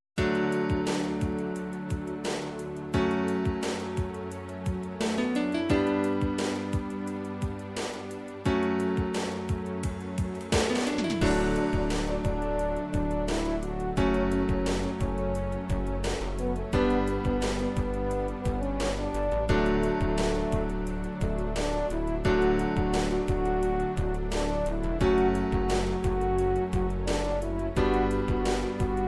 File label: italian brazil, midi